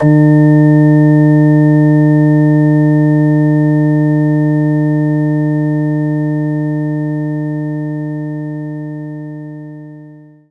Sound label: Hammond Organ; knights try